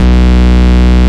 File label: Root B, Ultimate Bass; Vengeance Samples